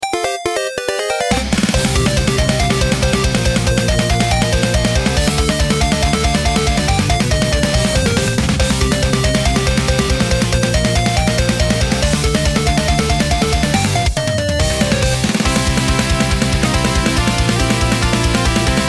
metal shred; freepixel; Retro Genres

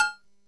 item drop; Items & Pickups

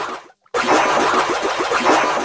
swim

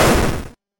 aoe explosion; Tower Defense; freepixel

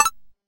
ammo pickup; Items & Pickups

